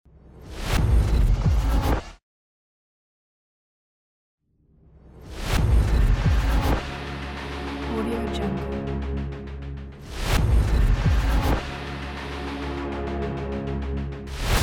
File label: hollywood trailer